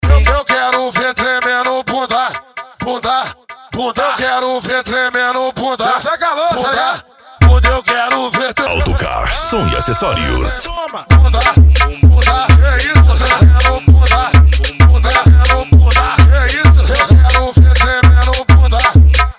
funk agressivo ritmado e funkadao

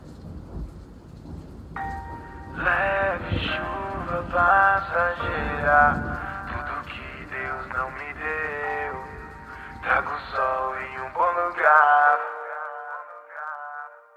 rap; cd rap flash sound

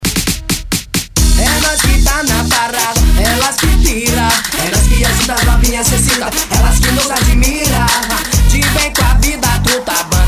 eletro e viola especial de eletro funk

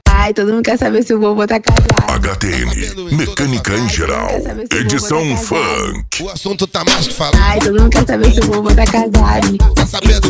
mecanica em geral edicao funk

